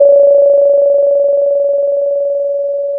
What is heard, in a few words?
pop, Sound of a phone ringing